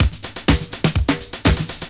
LOP3 R, Drum Loops; Big Fish Audio; Prosonus 500 Drum Loops

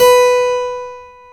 KEY HRPCR; Roland - LA Composer vol. 1

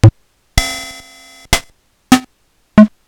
Yamaha PSS-140

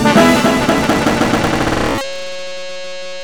brass; Big Beat Samples & More; AKAI

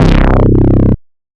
Dist Bass; Big Fish Audio; Old Skool House; OSH Bass

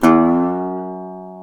GTR, Dobros, National

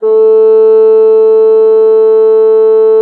FAGM A3, BASSOON; BASSOON3 S; Xsample VOL-6

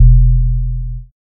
SUFFOCATE, Bass Analog & Synth; USB Soundscan vol.12; AKAI

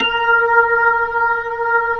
B-3, Jazz

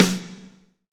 SD Real, Roland; Sound & Vision - Gigapack I

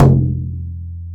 BODHRAN, ETHNIC PER; AKAI S-Series CD-ROM Sound Library VOL-5

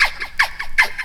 vox special; Ueberschall; Techno Trance Essentials

